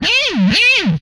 Inhuman Death, Vocal; retro arcade

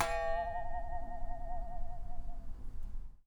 Flick, Japanese Pull Saw

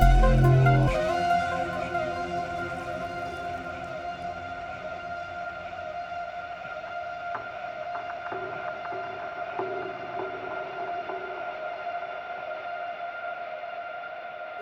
Pads

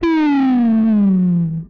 Bomb, retro arcade; Guns

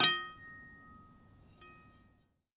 Big Knife Tap, Kitchen Knives; Micro Pack

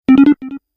three Tone; kenney digitalaudio

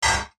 metal hit; wood metal SFX

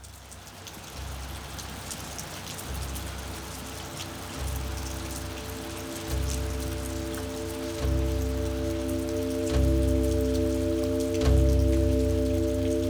Rain and Pads; BB 2HTC Samples Vol 4

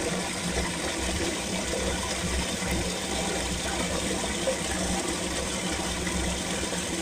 loop water; water splash slime

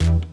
Clap, Drums; BB 2HTC Samples Vol 4 Addendum

